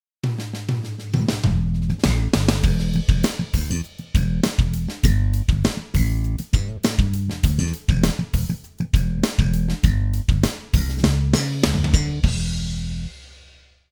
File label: bass, drum